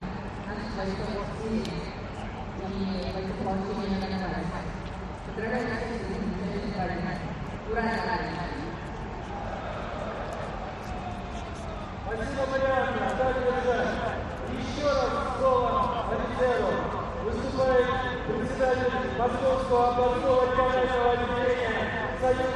moscow russia crowd winter red square opposition rally distant agitator from pa; light crowd